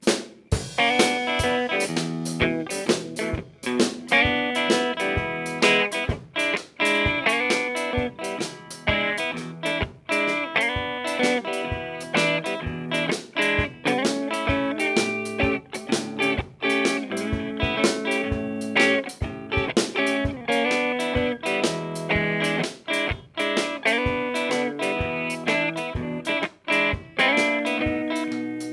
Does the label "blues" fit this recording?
Yes